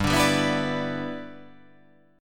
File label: G 6th